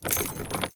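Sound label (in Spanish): CADENAS DRAG, chains drag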